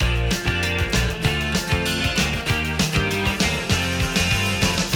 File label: MUSIC